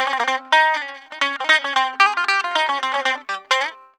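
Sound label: electric bouzouki; EL.BOU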